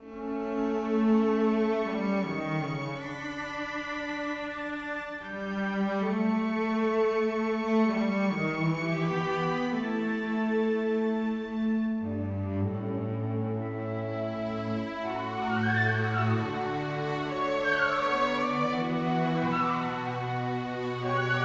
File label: World Music